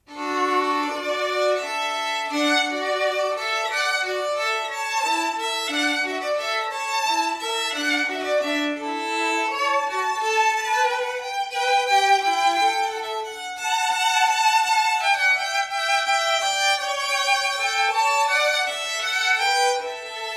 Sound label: Hornpipe